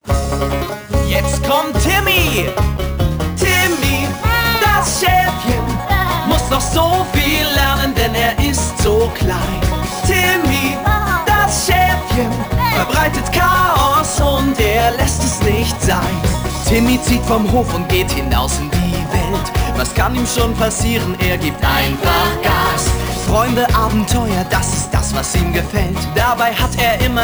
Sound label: Theme Song (German); timmy time 2011 nds gamerip